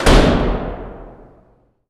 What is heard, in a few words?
DOOR Big Slam; doorSounds